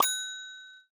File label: windows error